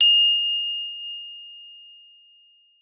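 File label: GLOP F5, glockenspiel; Xsample VOL-4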